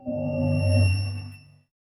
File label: space